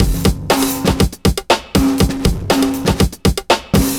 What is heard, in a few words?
chemical 120, loops, 120bpm